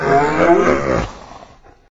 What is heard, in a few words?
worm pain; npc; svencoop; morass beta